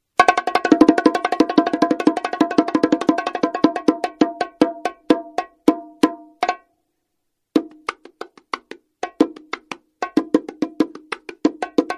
MEINL NORMAL PATTERN, bongo; FREE RIDE WOOD; FWB400

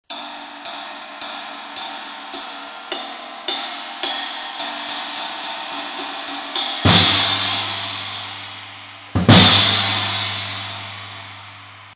trash, drumline; BOSPHORUS; TRASH CRASH; STANTON MOORE

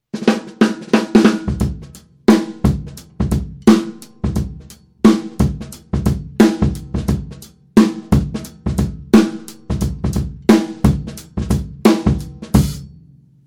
kick port

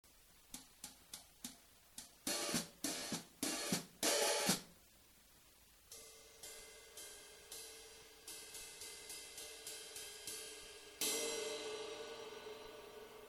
cym, brushes

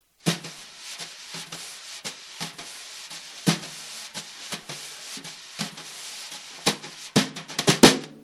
sweep, brushes

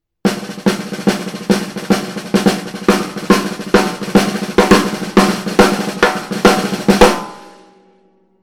skintone solo, drumline

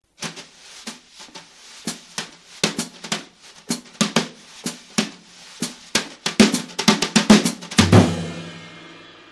brushes

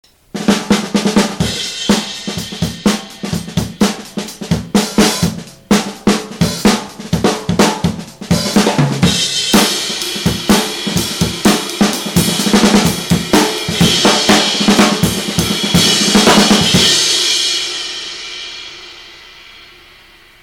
set rock

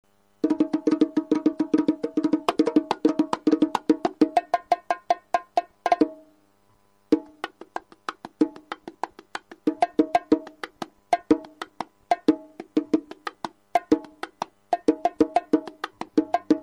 bongo, NOMAL PATTERN

